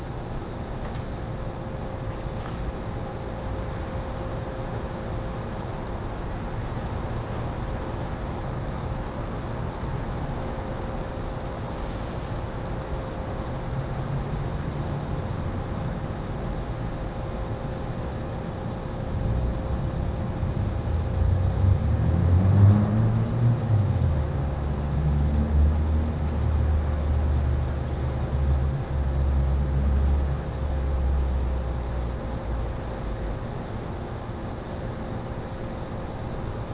ext sunset distant city traffic rooftop light machine hum birds stereo; fvtt sonniss gdc audio